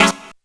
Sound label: CHRD HIT; SP1200; E-MU Producer Series Vol. 8 – Platinum Phatt; PlatinumPhattCD1